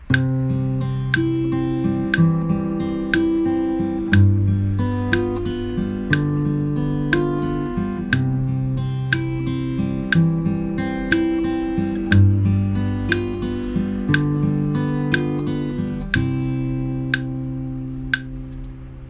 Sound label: arp